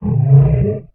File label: Creature Growl, Horror Effects